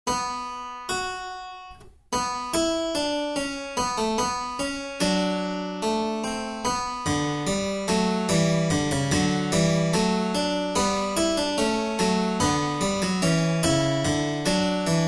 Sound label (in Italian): Fuga Alla Sesta; Harmonie Beethoven